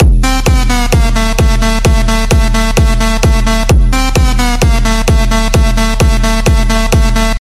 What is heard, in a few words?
Techno